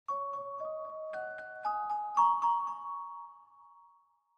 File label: Clavichord Chinese M; keyboards